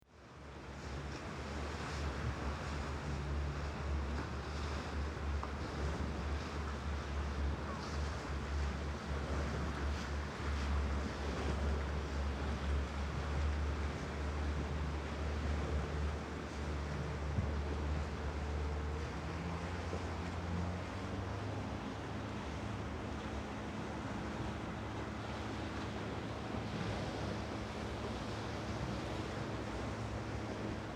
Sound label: Dike; lesconil2025